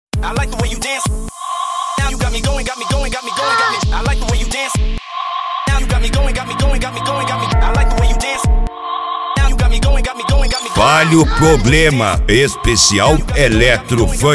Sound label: eletro funk